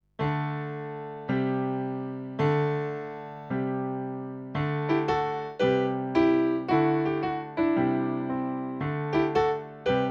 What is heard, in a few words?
Piano